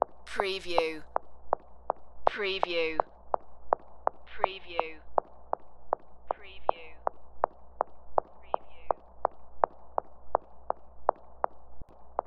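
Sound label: Footsteps High Heels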